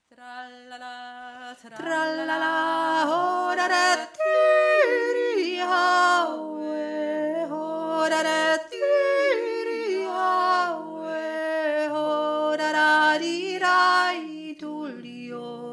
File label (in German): trallala obere stimme